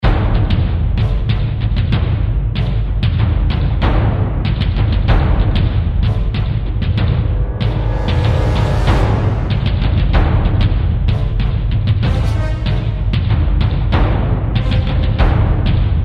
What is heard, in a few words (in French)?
SERIE DRAMA MARCHE